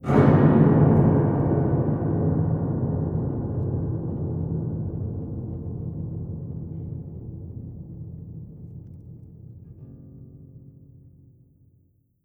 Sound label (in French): Choc piano